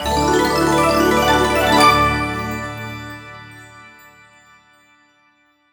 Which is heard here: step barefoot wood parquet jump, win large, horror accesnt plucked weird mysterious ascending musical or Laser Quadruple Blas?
win large